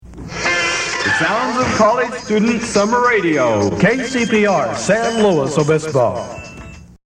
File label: Summer IDs Jingles